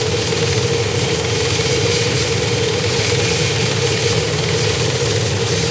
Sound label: whine, apache; svencoop; paranoia